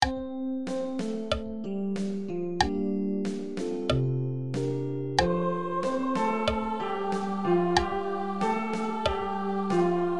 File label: gloria Alto Slow; paulusfest